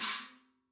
China Choke; ZKCC 17; apulSoft apTrigga2; Multi-Velocity Cymbal Samples

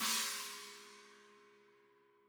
China, cymbal; ZKCC 17; apulSoft apTrigga2; Multi-Velocity Cymbal Samples

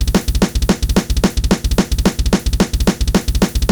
Drums; deadfoot drum training

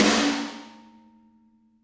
Snare Crack

